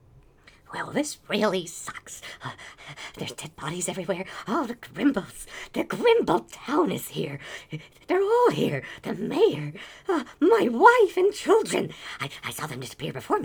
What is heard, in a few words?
Male Idle